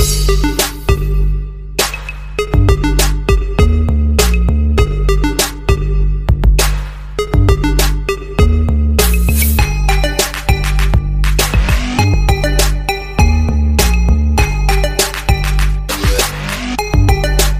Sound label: trap; thug life